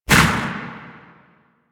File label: Shot, Weapons; Lato Tekna; DS Anniversary; Warframe; DE